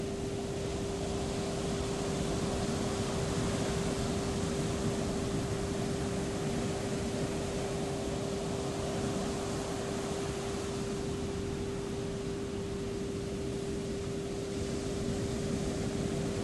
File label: ocean sounds